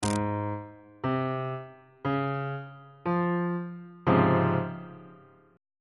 c sharp major 7th 2nd inversion; bass clef

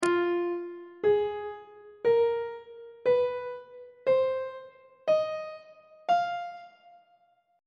f blues scale on treble clef

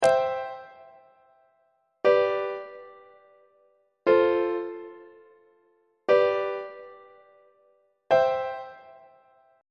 b sharp major root position iac; on treble clef

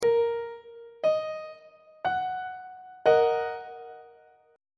e flat minor 2nd inversion on treble clef

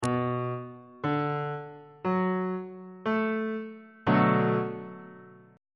d major 6th 3rd inversion on bass clef

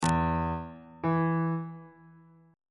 e note on bass clef

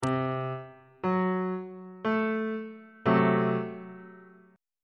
f sharp diminished 2nd inversion on bass clef

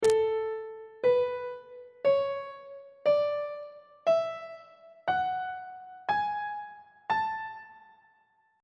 b double flat major note positions on treble clef

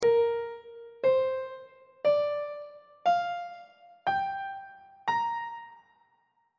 b flat major pentatonic scale on treble clef

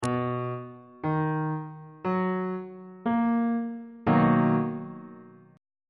a double sharp major 7th chord on bass clef

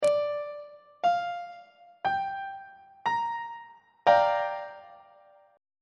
g minor 7th 2nd inversion on treble clef

